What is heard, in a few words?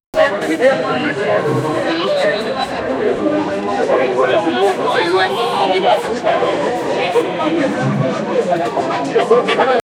background marketplace